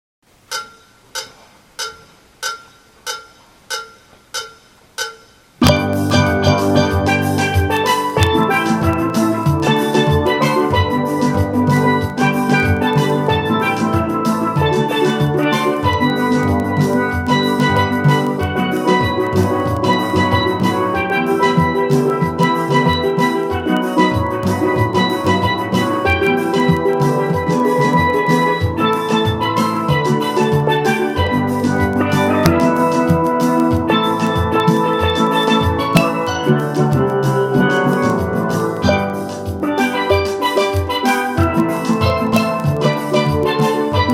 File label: Tutti